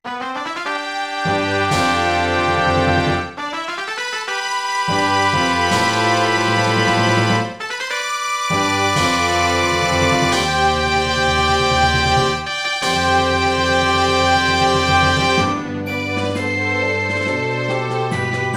All Clear; Star Fox 64; n64

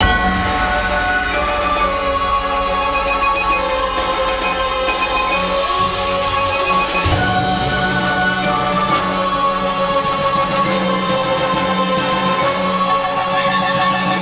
Imperial Battle; Lego Battles 2009 NDS

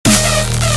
Dubstep

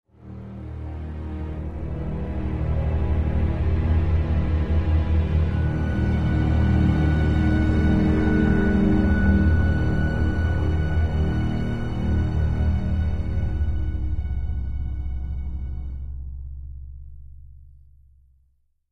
Linear Tense; the walking dead season one gamerip 2012

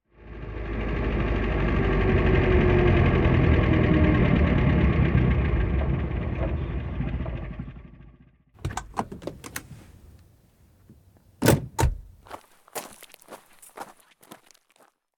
Car Riley

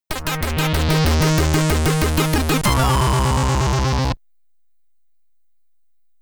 Get Ready! (SID Stereo); Commodore 64

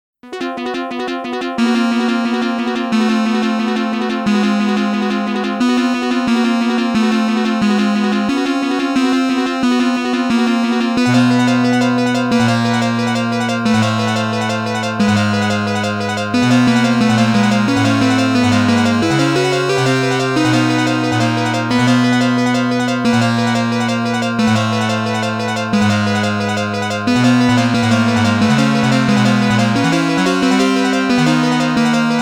Boss BGM; Gun Smoke

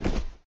Body Collision; Lethal Company; Windows

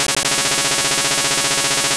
Hyper Beam; Pokemon; Gen 2; attack moves; GSC

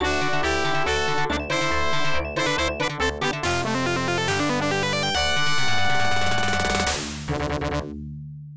Route Opened; Aerobiz Supersonic; Genesis

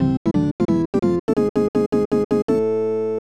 Unused Jingle; Super Double Yakuman; SNES

